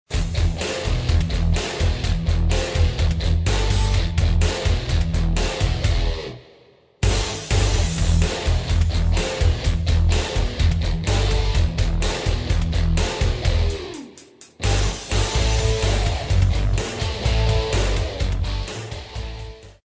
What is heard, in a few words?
rock heavy